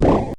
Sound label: bounce